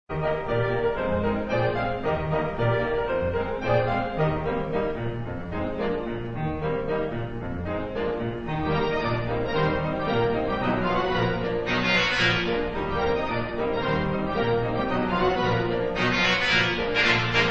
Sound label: theatre organ; senate